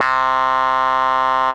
Magma Rattler, Bass Synth Lead; Ableton; Creative Extensions

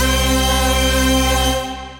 Church stab, Synth Keys

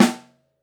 SN Mono, Snare

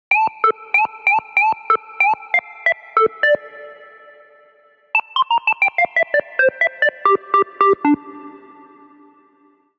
Sine D Minor 88bpm; MIDI Clips; Melodic; Ableton; Factory Packs; Chop and Swing

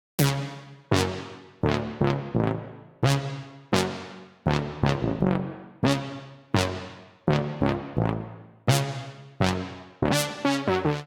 Funk Lead F# Minor 85 bpm; MIDI Clips; Tonal; Melodic; Ableton; Factory Packs; Chop and Swing

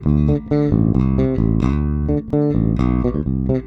130 Old School Bass D, E-Bass; Pop Rock

